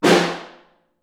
Far, Snare; Ableton Foundation FreePacks; Snare Designer

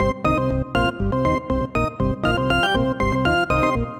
Groovy Organ - Cmin 120 bpm; MIDI Clips; Tonal; Motifs; Ableton; Factory Packs; Mood Reel

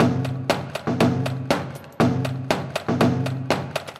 Kit, drum racks; Gravity Werks; Ableton; Factory Packs; Mood Reel

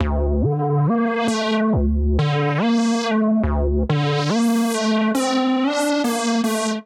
Hard Garage D Minor 140 bpm, Bassline; MIDI Clips; Tonal; Skitter and Step; Ableton Factory Packs

